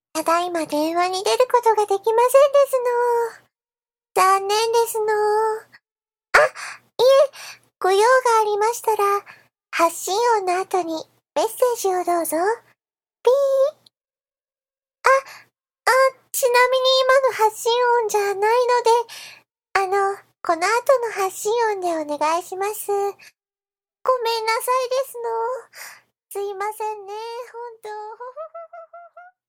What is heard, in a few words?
hohoho